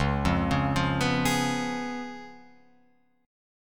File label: C sharp 7th C sharp, Guitar Open F; C#7; arpeggiated; JGuitar